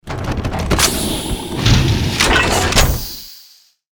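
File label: plasma cannon, ships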